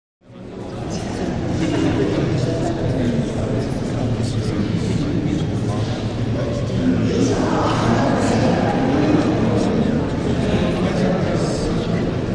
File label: Int Boxing Crowd, chatter walla